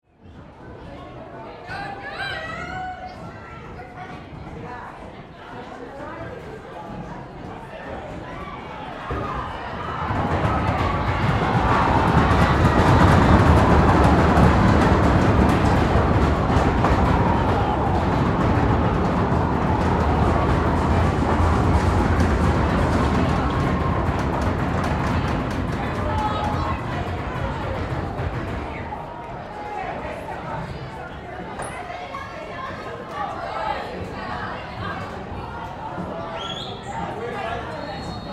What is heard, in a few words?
Football Game, High, School, Entrance, Under, Bleachers, Ambience; Sports; Football Sound Effects; BlastWave